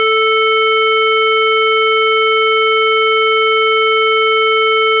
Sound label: 440Hz 2bit